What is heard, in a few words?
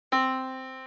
yamaha Disk